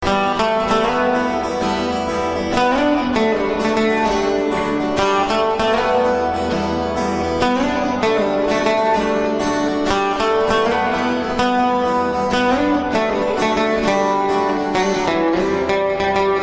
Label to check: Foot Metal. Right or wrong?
Wrong